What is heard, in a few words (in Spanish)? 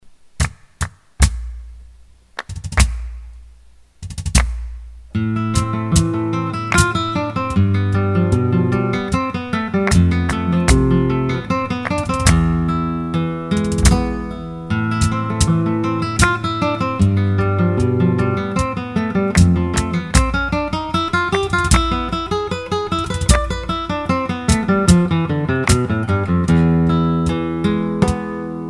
Soleares falseta